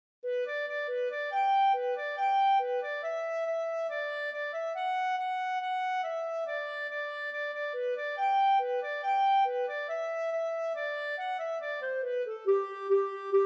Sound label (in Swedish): Gammelschottis